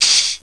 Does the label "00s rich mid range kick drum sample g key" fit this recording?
No